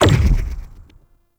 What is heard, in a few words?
Noctua Shot Punch